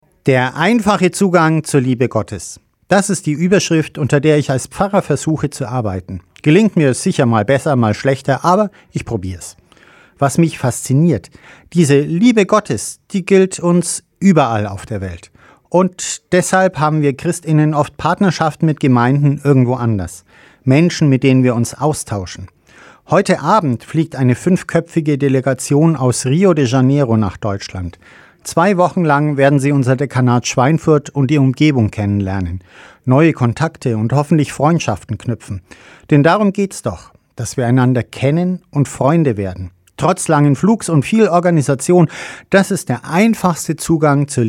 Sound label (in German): gong